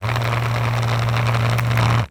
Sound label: Technology Electronic Cell Phone Vibrate On Cement